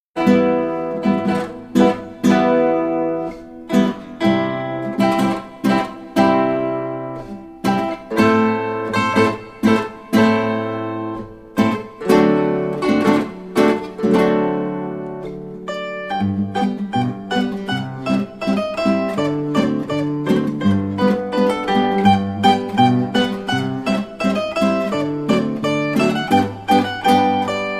Slawische Polka

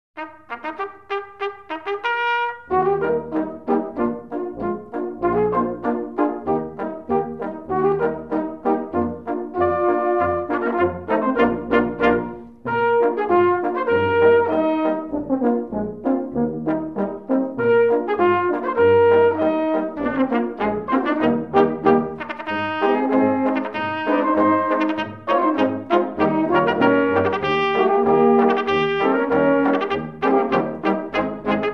Polka

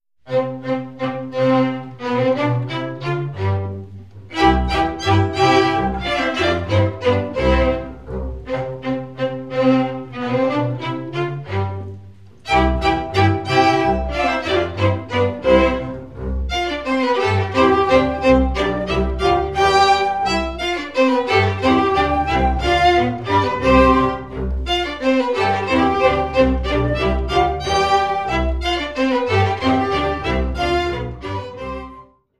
Historische Floetentoene Menueto Presto

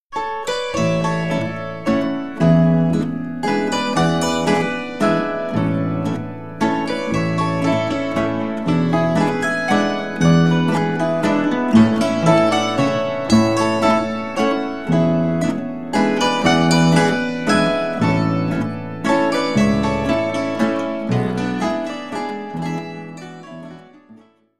Tanzweisen Saitentrio Landler

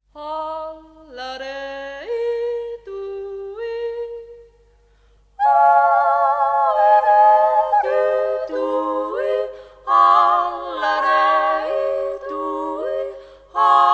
Jodler; Kreuth

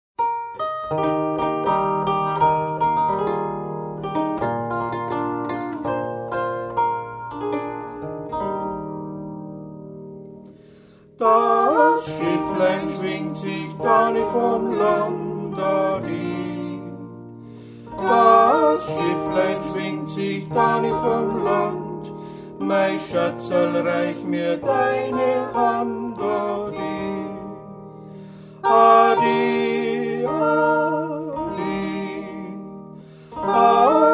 Wachauer Schifferlied

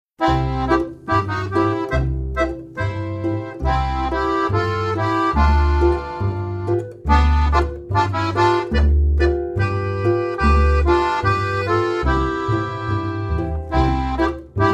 Polka Bayerisch; Haag

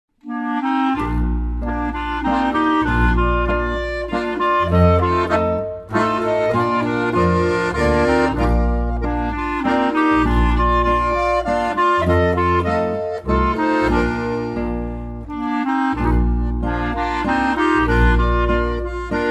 Laendler

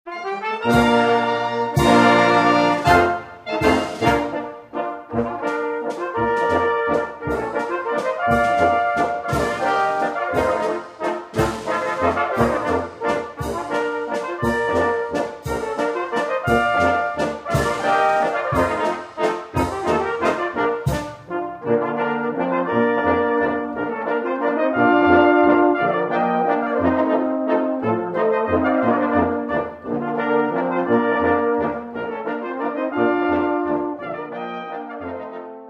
Doerfliche Blasmusik Halbwalzer

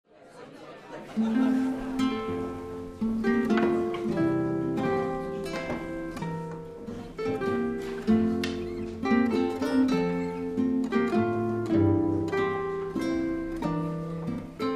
Berchtesgadener Saitenmusik; Unterm Kerschbaum

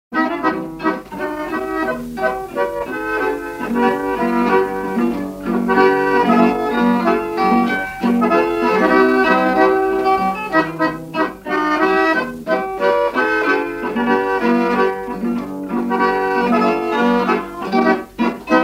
Boarischer; Rupertiwinkler

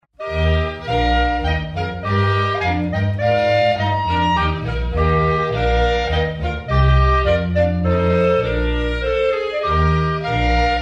Quadrille; Stelzentanz

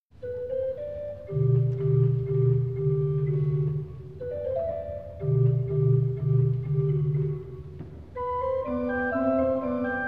Volksmusik Chiemgau

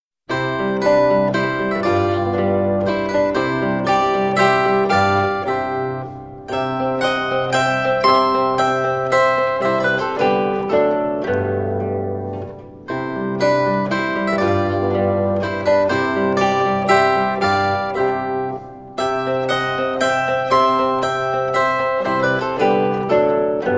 Spielmusik Zitherduo Menuet

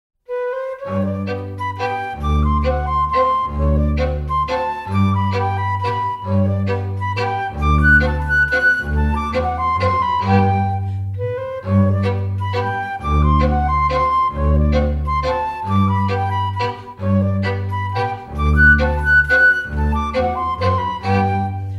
Floetten Laendler

